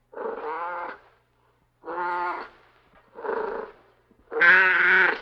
gatto; Inquisitor 2

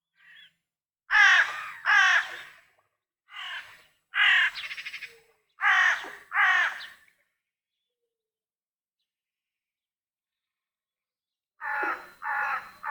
Corvi; Inquisitor 2

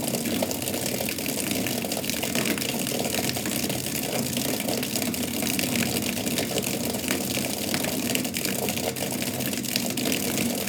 acqua su legno; Inquisitor 3

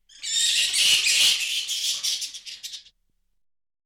pipistrello; Inquisitor 2

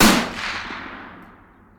Archibugio

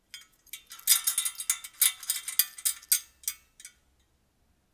rumori catene; Inquisitor 3

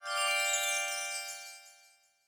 accademia magica; BLIND QUEST - The Enchanted Castle

